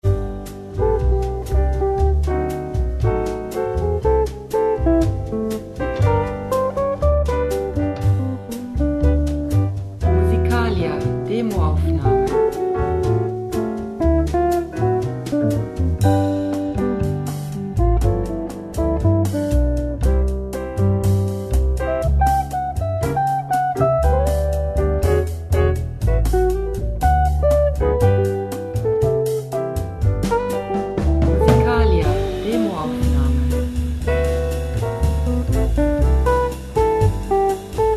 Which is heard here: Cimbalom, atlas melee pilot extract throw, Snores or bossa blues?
bossa blues